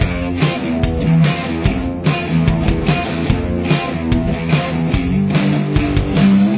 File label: riff 1 guitar